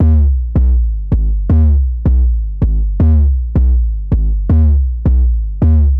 Distorted Bass, Drum & Bass; Fluid Dynamics

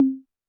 TD-20 Tom A, Roland TD-20 Toms

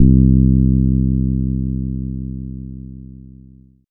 Bass Note C

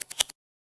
LOOP PC, Drum & Bass, 160 BPM; Ueberschall; Drum & Bass Flash Trackz

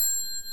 FLUTE & BELL; OMI - Universe of Sounds

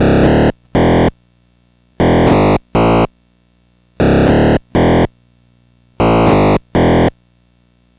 Atari 2600 Bass, chiptune

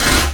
Kathunk, drum machine

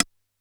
Flute Key Click

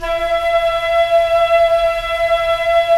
Flute, Roland LA Composer

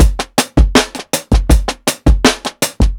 PICCOLO 80 16TH BEAT, DRUMS LOOPS; BASEMENT DRUMS VOL 2

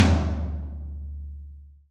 TOM TOM, Roland

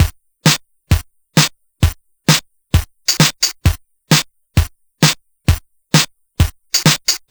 Game Boy Drum Loop

